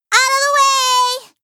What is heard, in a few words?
battlecry; hat kid; a hat in time